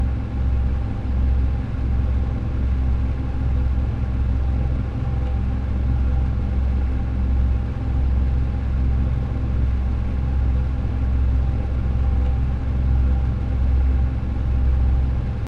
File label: Ambient Engine; Among Us